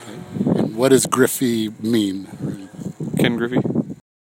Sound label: dog